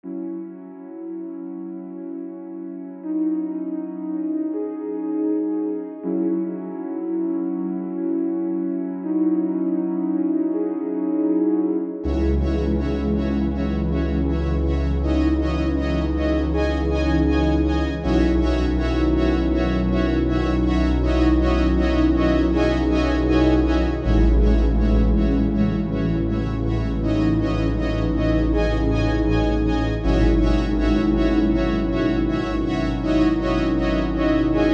supersaw, Beautiful saw sounds with the character of some 80's icons like the CS60 and Prophet 5; TYPICAL SAWs; CINEMATIQUE; KLANG